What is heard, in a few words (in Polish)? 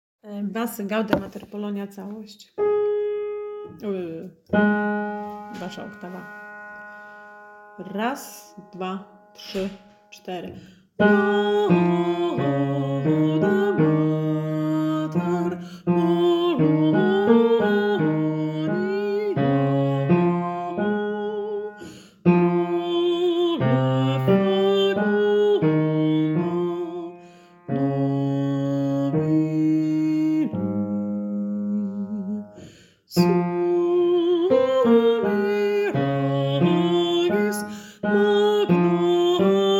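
Całość Bas; Gaude Mater Polonia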